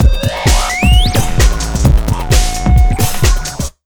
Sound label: JUMP 130, Big Beat Drumloops; USB Soundscan vol.25; AKAI